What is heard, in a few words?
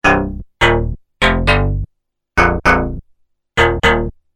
clunk